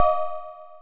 Cymbal 4 Osc Ping, Modular; Wiard 300 Series

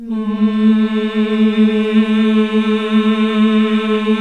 MMH A1, CLASSIC VOX

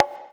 Percussion, House

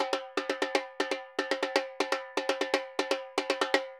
Repique Bomba 120, Drums & Percussion, 120 bpm; Best Service; Festa Latina VOL-1

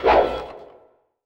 whip, Drum N Bass; Sonic Foundry (Sony Creative Software); Knutrix Drum & Bass

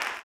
CLAP, Blues Soul; Spectrasonics Vocal Planet

